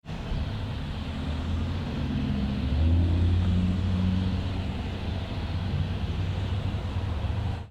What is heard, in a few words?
engine bus